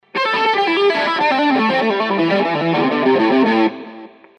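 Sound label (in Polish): gitara elektryczna pentatonika a-moll skala triolami do dolu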